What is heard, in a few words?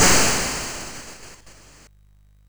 sid MOS6581R2